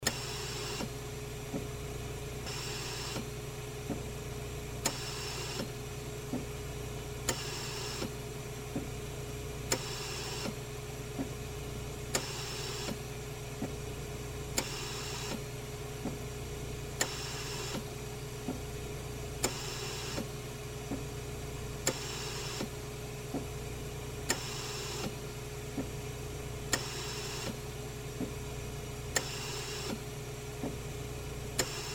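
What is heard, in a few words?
Ventilator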